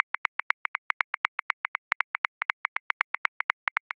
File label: Hip Hop Wonky 16ths 80 bpm; Grooves; Ableton Live 12 Lite; Core Library